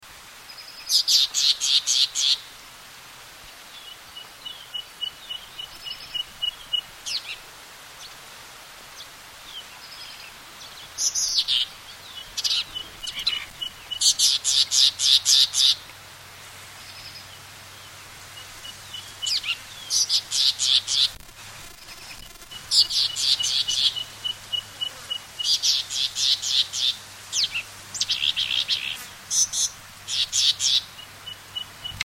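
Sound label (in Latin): parus rufiventris, Sikory; Sikora rdzawobrzucha; ptaki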